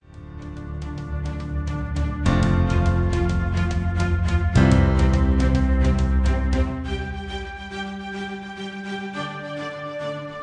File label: Corporate epic music with string orchestra